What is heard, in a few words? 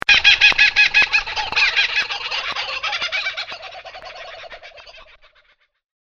kookaburra; german australian